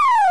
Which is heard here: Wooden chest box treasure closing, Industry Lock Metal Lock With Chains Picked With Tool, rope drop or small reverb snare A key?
rope drop